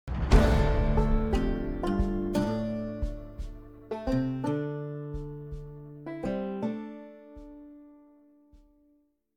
level up theme; project zomboid

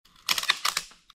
tree branch cracks

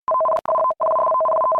emf scan

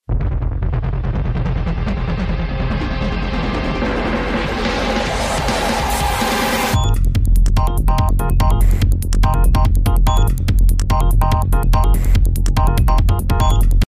lock in theme

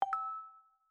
success vivo

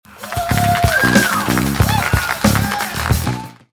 applause

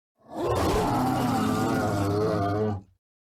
ravager roar

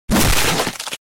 punch bloody

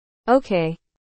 ok button

